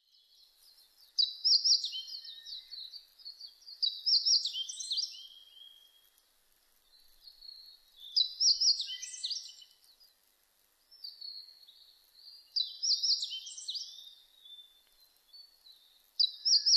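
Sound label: hoojiro, meadow bunting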